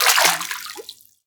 fs fm water, footsteps; player; Portal 2

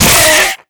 pain, headcrab black; creatures; vox

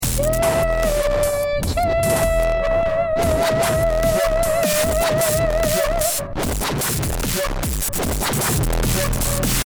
start action, music

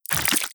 debris flesh, bullet impact debris

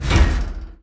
tick, carousel; hinges drawers

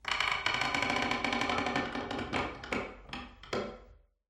ambient wood board creak

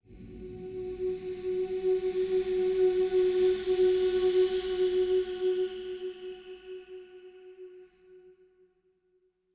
ambient beautiful destruction, synth; Portal 2

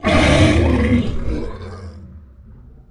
xen bloater pain; infestation; Half Life Alyx

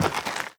step light, footsteps, gravel; player

